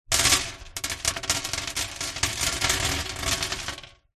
foley stones small gravel drop handful on empty wooden box lid recorded inside